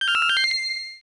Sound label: Credit SFX; marvel land namco system 2 arcade gamerip 1989